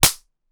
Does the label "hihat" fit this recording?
No